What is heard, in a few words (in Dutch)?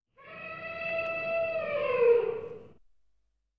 Olifant In de zoo; KMP Film Video Sound Effects